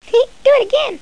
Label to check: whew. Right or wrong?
Right